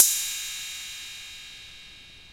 FULLDECAY A1, Techno Trance; E-MU Formula 4000 Series Vol. 2

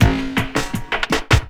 LOOP, 160 BPM, Drum & Bass

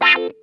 WAH, Funk Guitar; Zero G - Funk Guitar

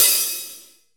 HAT; LITE; E-MU Formula 4000 Series Vol. 3; Analog Odyssey; VK Instruments

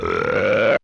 vocal

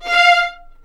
F5, VLNS ARCO; East West; The Ultimate String Collection

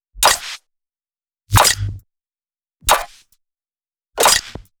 snare lumbago snares looped; Polyend; Recue

